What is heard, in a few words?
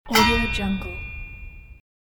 epic metal hit steel impact sound